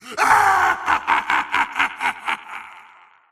wheel laugh; dota; razor

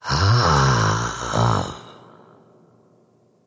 wolf attack emote; dota; crystalmaiden

